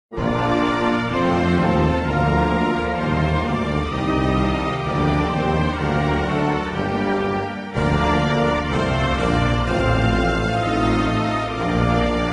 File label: serbia anthem